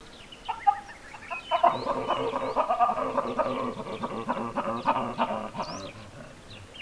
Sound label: Papio cynocephalus ursinus pant; Post-copulatory female calls at two phases of breathing in a large group of baboons